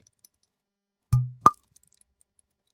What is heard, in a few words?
Cork Popping